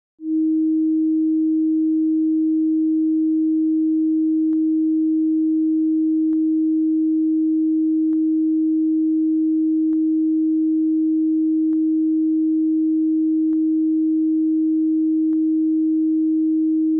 315 Hz Test Tone; XLO Reference Recordings; XLO - Test & Burn in CD (Gold)

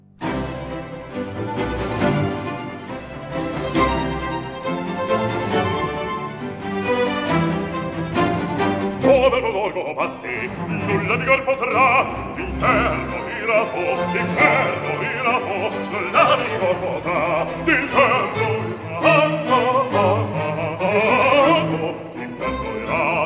Aria; Astolfo; Dove il valor combatte; Antonio Vivaldi (1678 - 1741); Vivaldi - Orlando furioso (2004); Atto III

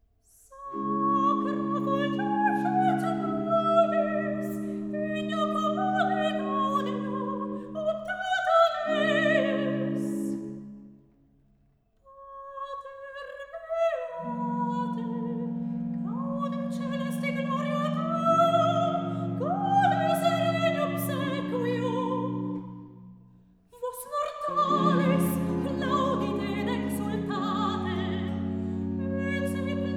Recitativo; Antonio Vivaldi (1678 - 1741); Vivaldi - Mottetti (2003)

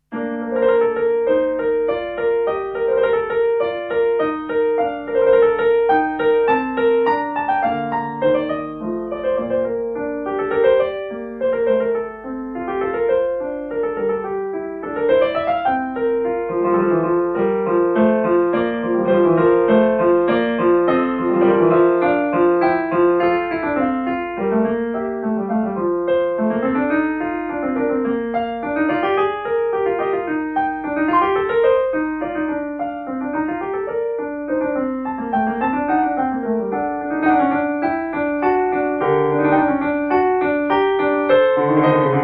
Keyboard Partita In B Flat - Praeludium; Bwv 825; Dinu Lipatti; Bach

